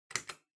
Light Switch Wide Panel Dry Mid

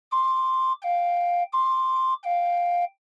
Ambulance Siren Fake Low

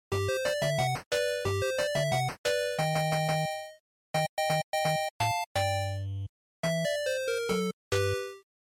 NES Action

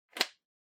Tablet PC Earphones Plug In

